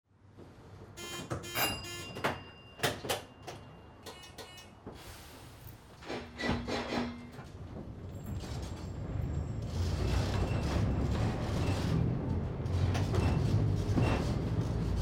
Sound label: Trolley Ride Ambient